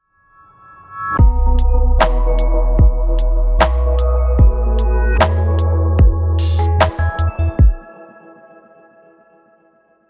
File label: minimal logo